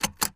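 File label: computer spacebar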